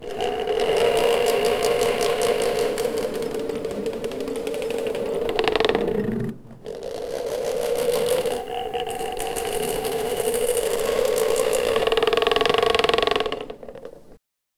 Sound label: wood spinning wheel cresc; crafting